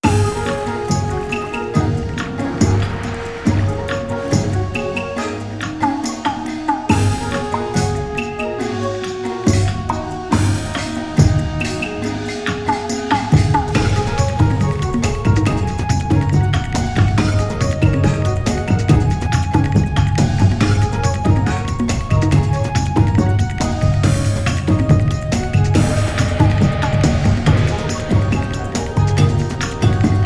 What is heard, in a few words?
stereo MUSIC only; DRAGONBOAT